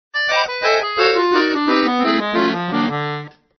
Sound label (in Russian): akkordeon; Звучание аккордеона; Звучание аккордеона в хорошем качестве